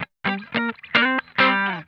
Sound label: GLIDE, Funk Guitar; Zero G - Funk Guitar